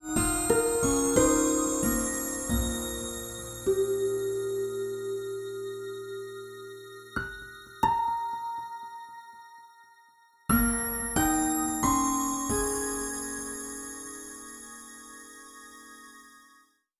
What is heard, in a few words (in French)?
delire de synthes